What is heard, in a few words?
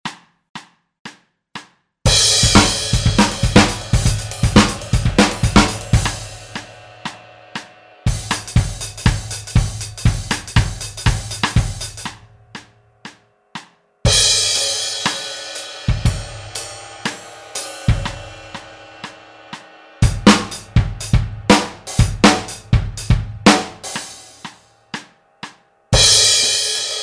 more jazz